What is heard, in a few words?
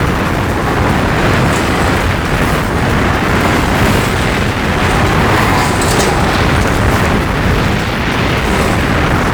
Shipwreck Rocket Loop; Ambience; Gameplay; Grineer; Warframe; Tau